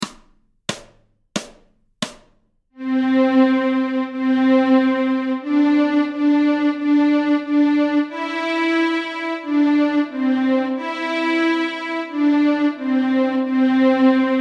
Neinaps Stråk C, Fiol

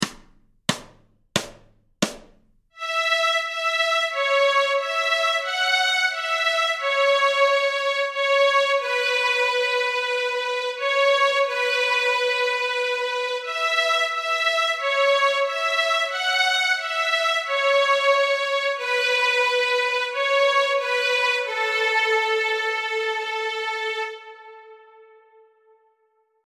Stråk A, Nyckelharpa; Camptown Ladies; A-strängen Steg 1 Två toner i dur

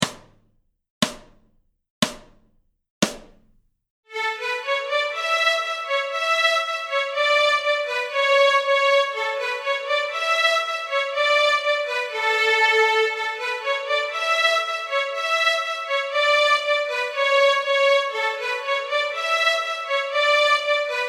Polkalåt, nyckelharpa; Polkalåt nr 2 Stråk A

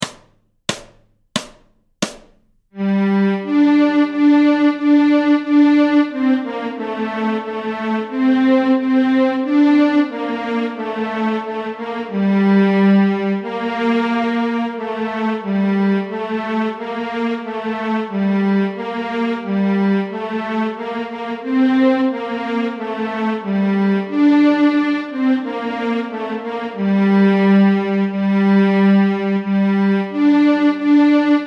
Stråk G moll, Nyckelharpa; Lilla lasse går och gråter; A-strängen Steg 1 Två toner i dur

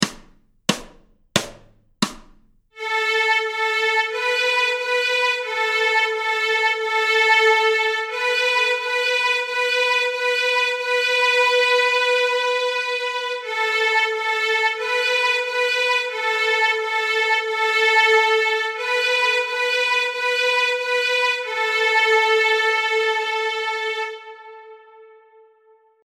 Första steget Stråk A, Nyckelharpa; Notträning

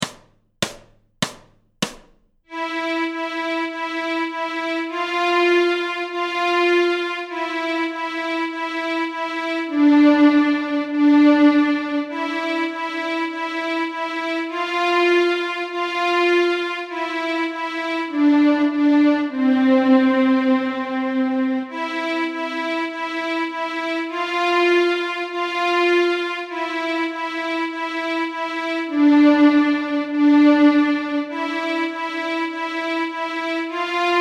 Stråk C, Fiol

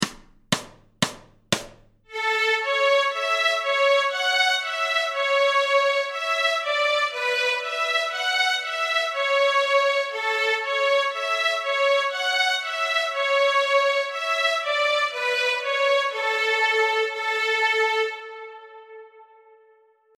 Skojlåt Stråk A, Fiol; Notträning

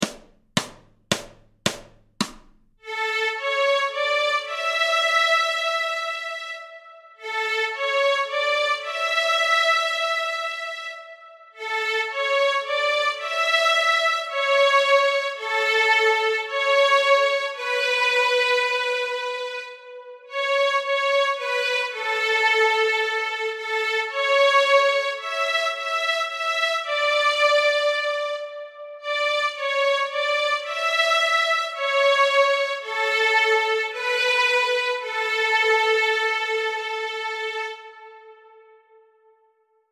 Stråk A, Fiol; Oh When the Saints; Notträning

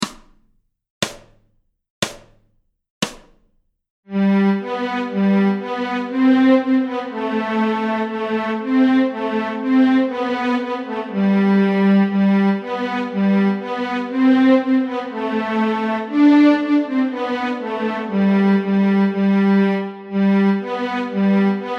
Polkalåt Stråk G, nyckelharpa

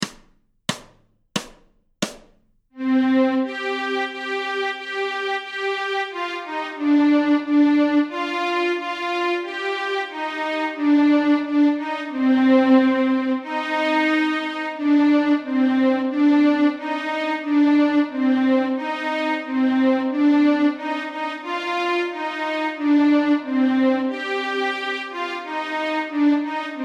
Lilla lasse går och gråter Stråk Cmoll, Fiol; Notträning

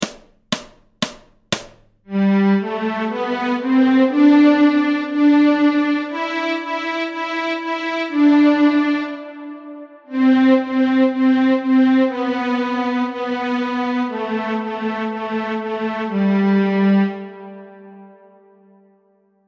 Stråk G, Fiol; Petronella

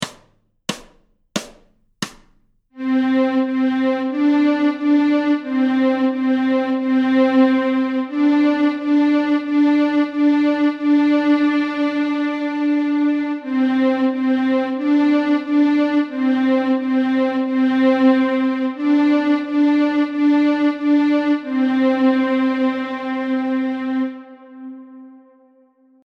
Första steget Stråk C, Nyckelharpa; Notträning

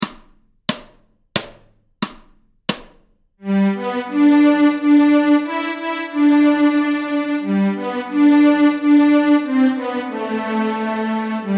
Kumbayah Stråk G, nyckelharpa

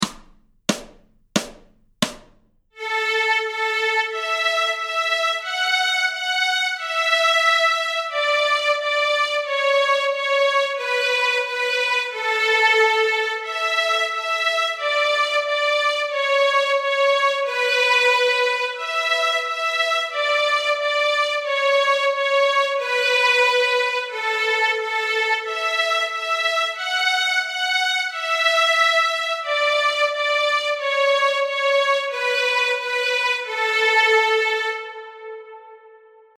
Blinka lilla Stråk A, Fiol; Notträning

